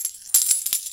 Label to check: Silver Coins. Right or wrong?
Right